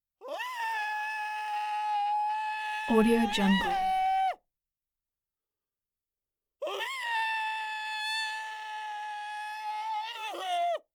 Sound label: humanoid creature voice screams outburst